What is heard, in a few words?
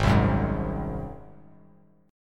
G sharp 7th G sharp